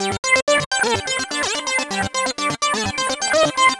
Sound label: 303 show G 126, synth loop; Synth Loops; Zero-G; Soundclash Nu Dance Electro; Acidized; 126bpm